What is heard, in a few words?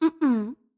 error; kak priruchit drakona; vysizhivat yajtsa